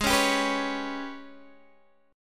Gsharp Minor Major 7th Double Flat 5th, Guitar, DADGAD; x,11,11,12,14,11